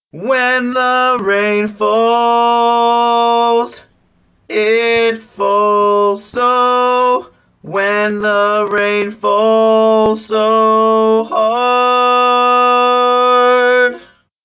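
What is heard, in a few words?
Baritone, barbershop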